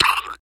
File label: Cobra Die